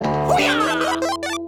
specialmission skills five point explosion

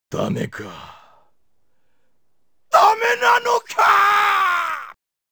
gameover; Chou Aniki Kyuukyoku Muteki Ginga Saikyou Otoko; ps1 gamerip 1995

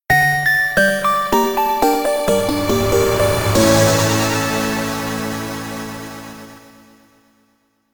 stinger; high strangeness; wii u

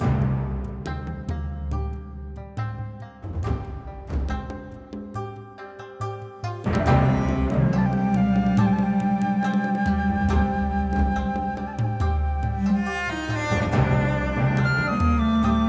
Menu Light

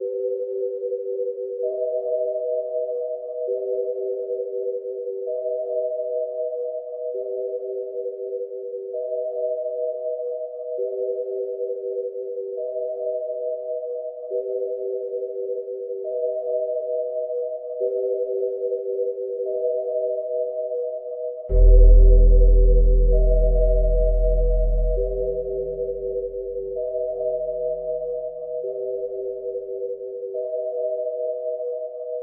Mausoleum Grave Theme; Serpent Creek; 9 Clues The Secret of Serpent Creek

